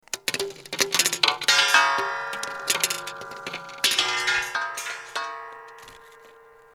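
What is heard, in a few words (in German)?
Metallgeklimper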